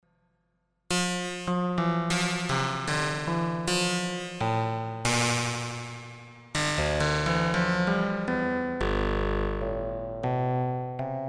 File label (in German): Loopstart X mit Filter